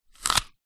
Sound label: human eat bite apple